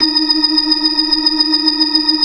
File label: FAST VIB, ORGAN, TREMOLO VIB